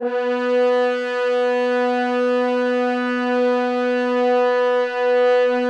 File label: French Horns, B2; Best Service ProSamples vol.51 - Classic Orchestra 2